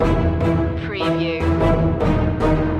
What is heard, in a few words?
neon nightscape distance loop